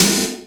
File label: IND; Zero G; Man Machine